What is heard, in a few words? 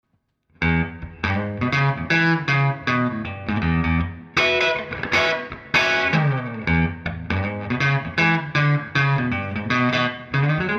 P90Hx Big